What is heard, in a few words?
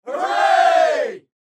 Hooray